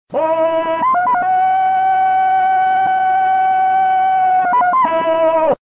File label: Tarzan Call